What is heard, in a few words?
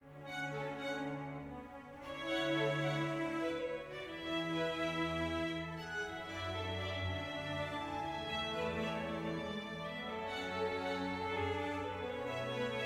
happy med; Quivr; PS4 Windows gamerip 2016